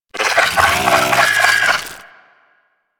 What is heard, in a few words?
Amalgam Moa Satyr Vocal Idle; Warframe